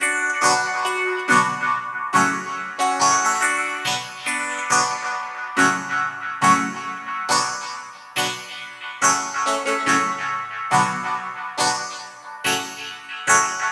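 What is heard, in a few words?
140 Bmin Harpsichord Dub Skanks; Zulu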